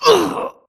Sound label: grunt death quick; Titanfall 2